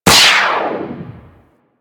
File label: Skate Dash; Warframe; Corpus Modular Crewman; Skate Movement